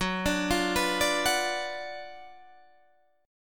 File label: F sharp Major 7th Suspended 4th Sharp 5th, F sharp, down, Guitar, Standard; F#M7sus4#5 arpeggiated